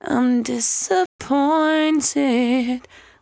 74-5bpm B, Velvet Vocal; While U Could; Zero-G

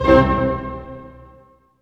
HIT STACO, Staccato Oct; String Master Series; Dynamic Orch

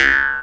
C# JAW, Primitive Instruments; Big Fish Audio - Didgeridoo and Other Primitive Instruments; JAW SIMPLE

